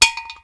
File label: metal; Mafie 1